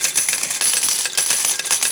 casino; lasvegas

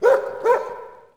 dog; Tony Hawk's Project 8; XBOX; houses

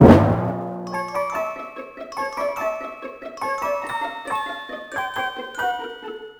Hit, Music

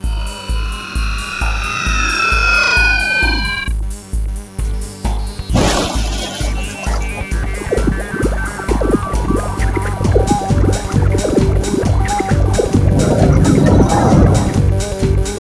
music intro; Ty The Tasmanian Tiger 2 Bush Rescue; XBOX

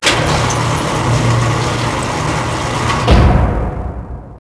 large door closes; Max Payne; cold steel

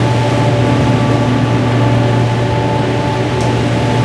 lab door

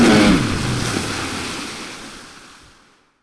stall, Engine; Splashdown; XBOX

